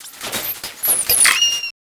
TECH 140 FX, Big Beat Drumloops

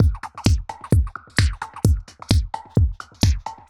Beat filt 130bpm, uk garage

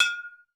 Jug, Alesis D4

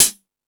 Hip House, drum machine; E-MU Pro-Cussion-1

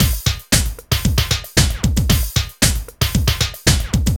Drum Loops

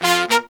Pop & Funk Brass

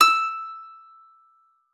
PSAL E6, psalter; Xsample VOL-8

